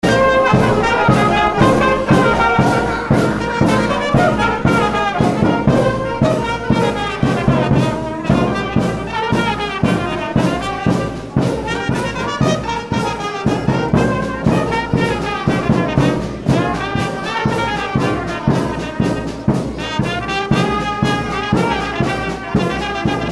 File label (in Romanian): fanfara; Costei